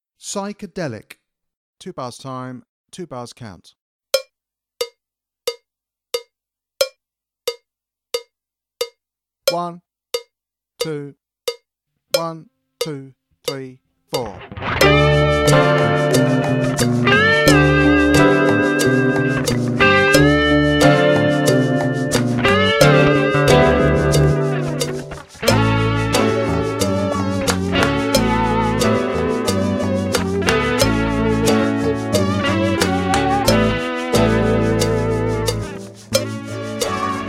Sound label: Psychedelic Backing, drum kit